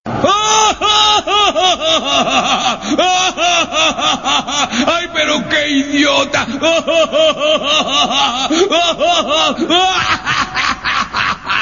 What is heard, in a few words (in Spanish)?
risa; homero